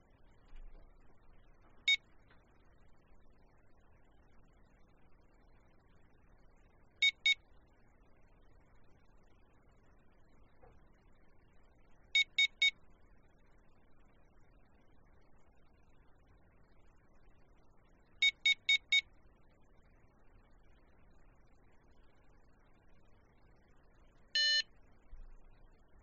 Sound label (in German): TON MINI Morse